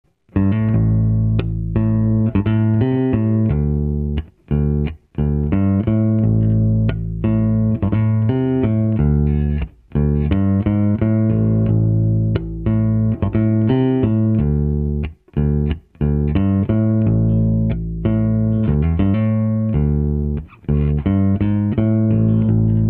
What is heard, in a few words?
7 pro Faital jazz bass; Jazzbass through the FaitalPro 15PR400; SVT7PRO; 14" is a good compromise. It has almost 15" sound and has better high frequency extension.